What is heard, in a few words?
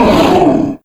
wolf die; wolfteam